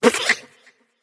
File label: clear throat light; smite; voicepack; hel